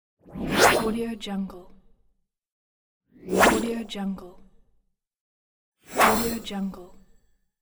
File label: whoosh cutting